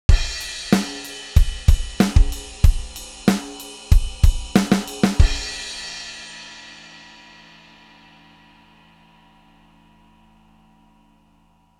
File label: All Natural Loop